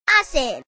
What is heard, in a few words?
exclaim; Chibi Knight